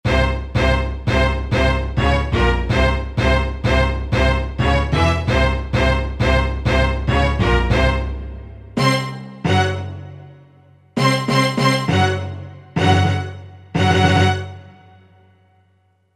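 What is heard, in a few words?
ensemble orchestra hit; Factory Presets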